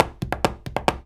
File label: 133 STEPS, Spanish And Gypsy; Soundscan vol.59; Spanish And Gypsy Traditions; AKAI